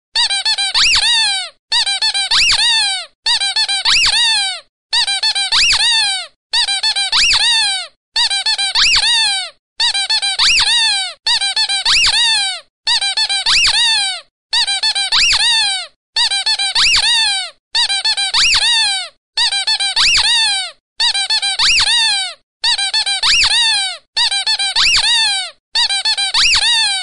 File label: Funny air horn sound